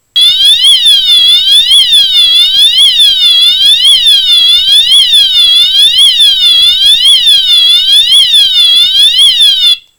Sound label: Siren